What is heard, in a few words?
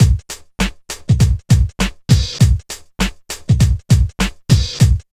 DRUM LP; East West - Phat & Phunky